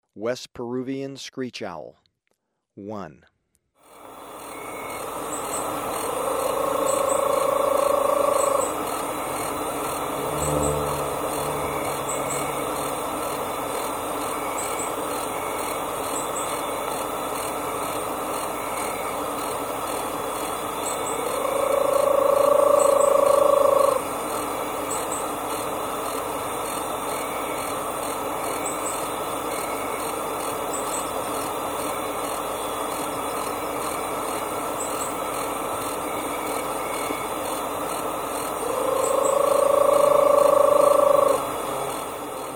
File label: West Peruvian Screech-Owl; S. America